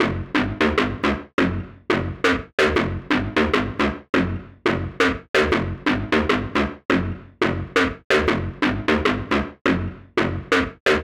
174 G- Synth

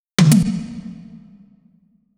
Fill

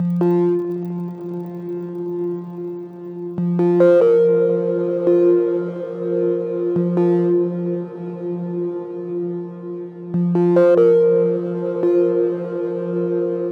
Synth 142bpm Fm Pluck Melodic Trap

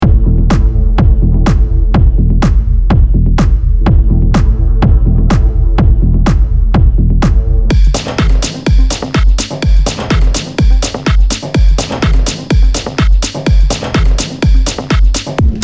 Subterranean Techno

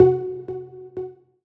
Sphinx Synth

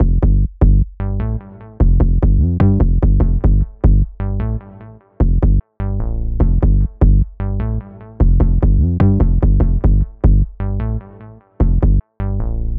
Fx 75bpm F Bass Shape Soul

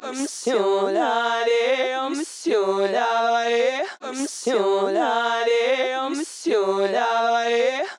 120BPM G CHANT DRY

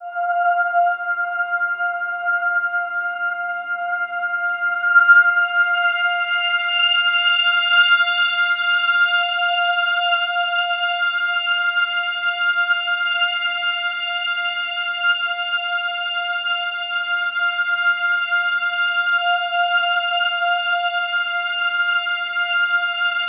LOOPS 125 BPM F

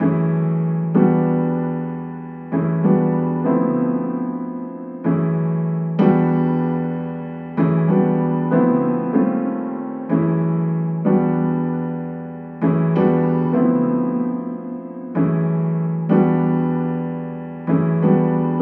95 Piano Chord Loop Bm; Goal

